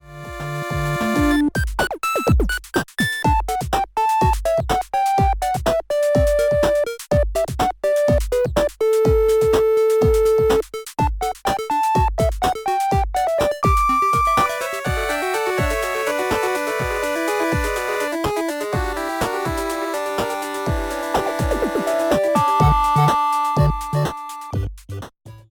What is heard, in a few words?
Town Theme Chiptune